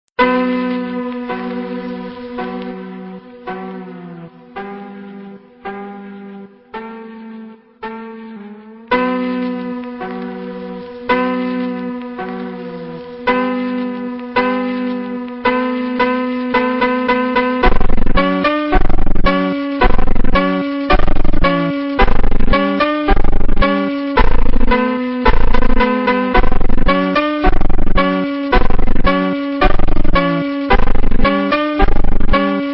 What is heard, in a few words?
funk ultra slowed reverb